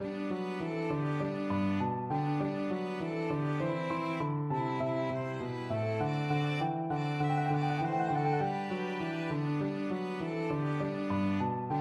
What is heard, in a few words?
jingle bells piano quartet